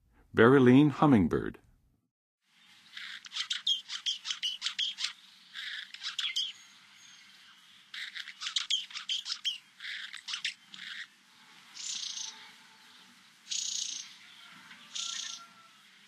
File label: Berylinne Hummingbird, Songs of Birds; North America; Western USA; Western USA Songbirds